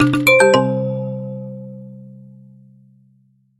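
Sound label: quiz sounds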